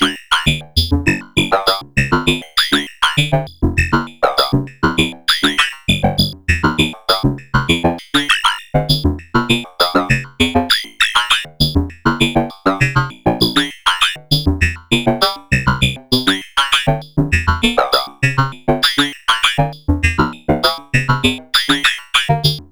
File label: VCO sample